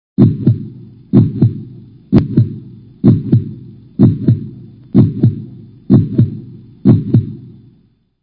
heart beat

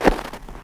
player snow; svencoop

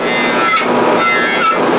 factory

